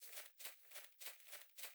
Climb

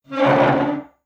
Chair Scrape; Welcome to the Game

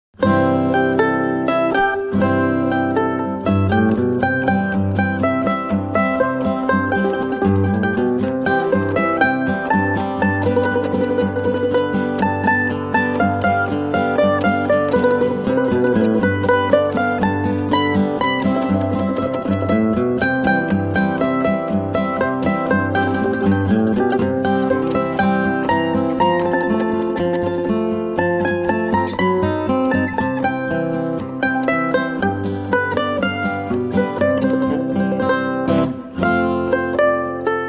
Polka Early; Sid Meier's Railroads

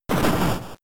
Slam; Pokemon; Gen 3; attack moves; RSE

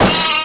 Sheep; Toy Story Mania; iOS gamerip

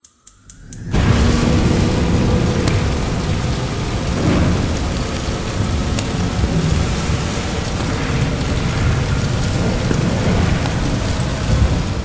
Sparks furnace ignite

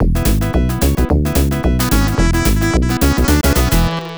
Level Start; Snake Rattle n Roll Genesis

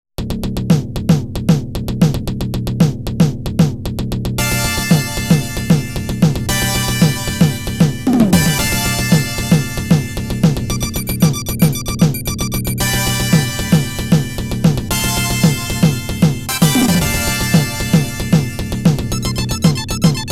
Inorganic Beat; Darius 30th Anniversary Box

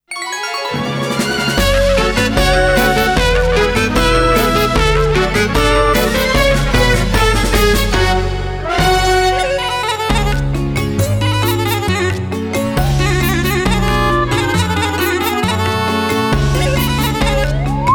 Instrumental Version; Disgaea 3

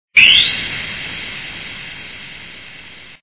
Spindash; Tails' Nightmare

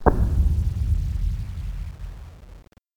Moon Thud; Learn to Fly 3

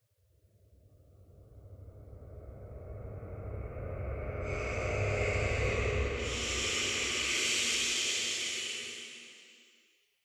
Interior Noise

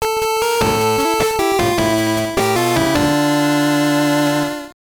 Jingle; power pro kun pocket 2; gbc

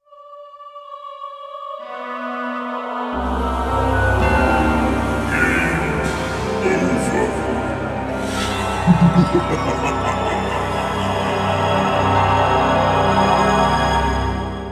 +Voice (Game Over); Castlevania: Symphony of the Night; Land of Benediction

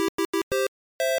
Obtain Item; Montezuma's Revenge; master system

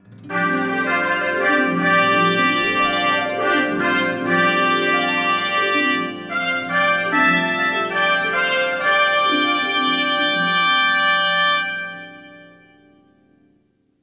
Course Intro Fanfare; crash bandicoot series sound box; Once Upon a Tire

